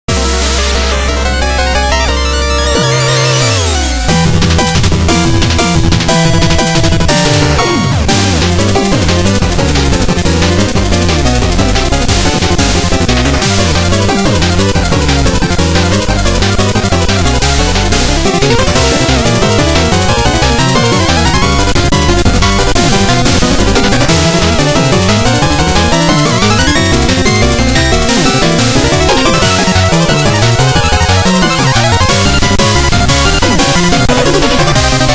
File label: Music Boss; Boss Plague; Shovel Knight King of Cards